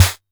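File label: vermona drm1 mk3 snare drum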